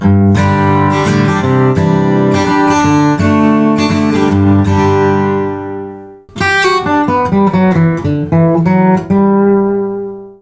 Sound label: Turtle Substitute Zamenitel pantsirya cherepahi